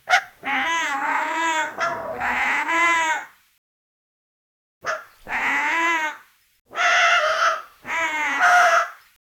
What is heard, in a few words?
hybrid roar of a, tyrannosaurus rex